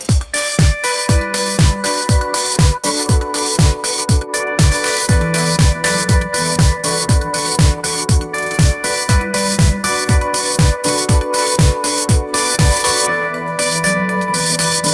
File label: pop groove, ringtones